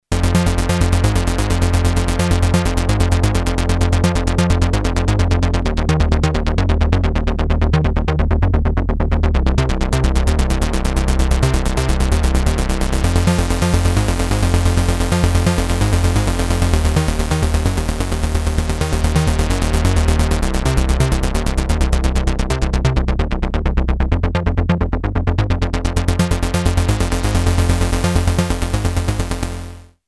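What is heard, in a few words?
seq ebm, synth; Polivoks formanta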